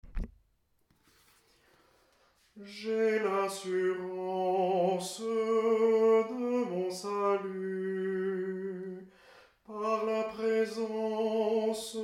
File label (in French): TENOR, chant a plusieurs voix; atelier de chant a plusieurs voix